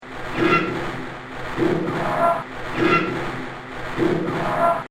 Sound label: Industrial Loop, horror; Industrial Loop 2 - Horror Fx; Miscellaneous Loops; Andrew Fleming